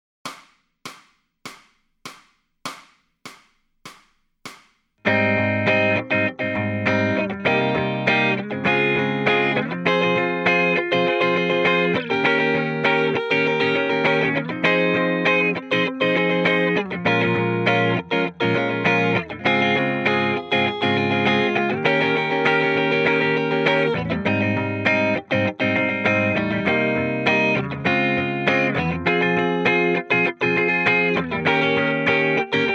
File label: Lead Backing Track, electric guitar; Grade 4